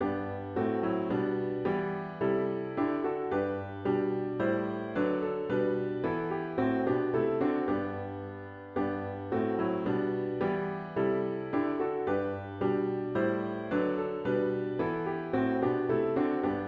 Theres a Wideness in Gods Mercy piano with Intro

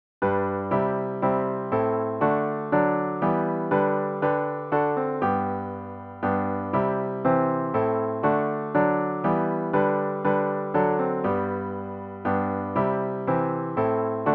piano with Intro; worship service

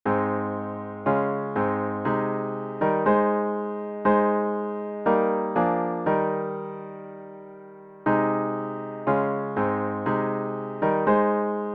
piano only; More Love to Thee O Christ

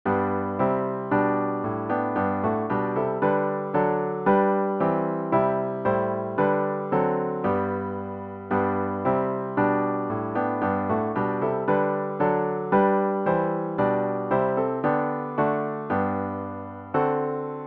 piano only; Guide Me O Thou Great Jehovah

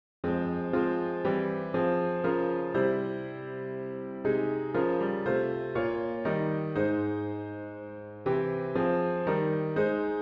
piano only; The God of Abraham

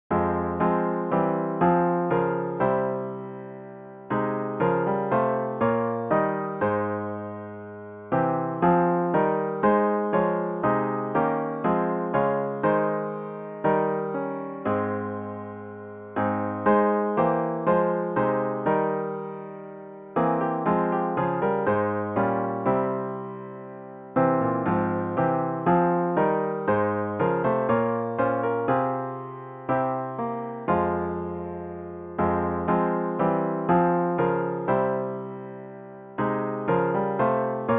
piano only; The God of Abraham Praise

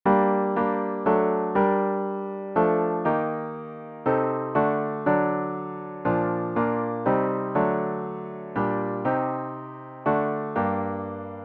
piano only; If Thou but Trust in God to Guide Thee

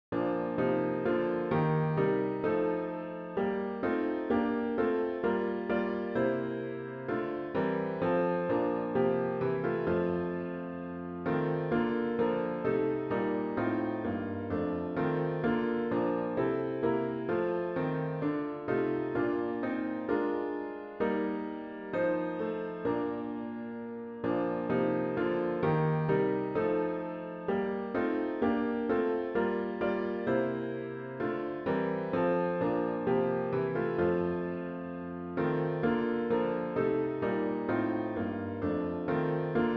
piano only; When Morning Glids the Skies

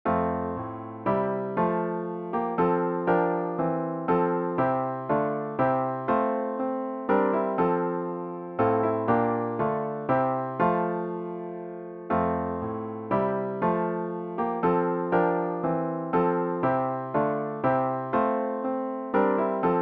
piano only; Come Thou Long Expected Jesus

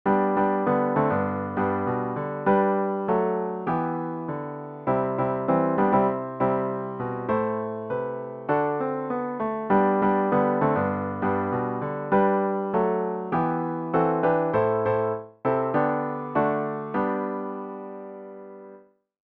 piano only; Halle Halle Hallelujah

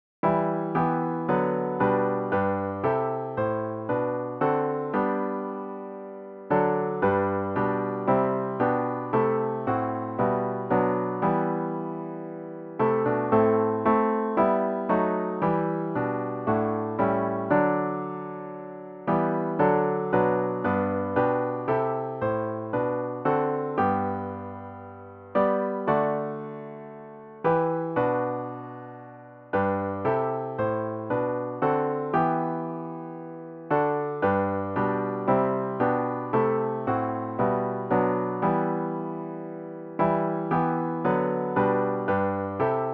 piano only; O Come O Come Emmanuel

